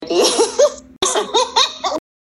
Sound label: laugh